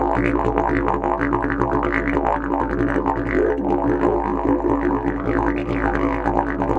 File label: AF BURBLE, Didgeridoo; Big Fish Audio - Didgeridoo and Other Primitive Instruments; BURBLDRONE